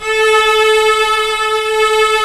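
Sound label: CELLO E3; WS#8 ORCHSTR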